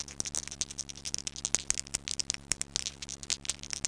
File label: dice; WAV Sound Effects; parks